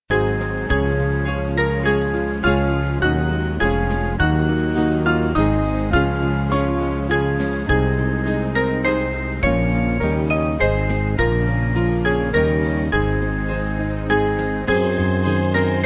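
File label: Band, Music Backing; We Gather Together; Kremser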